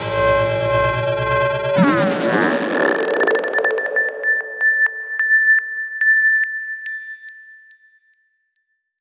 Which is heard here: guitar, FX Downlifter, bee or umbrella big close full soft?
FX Downlifter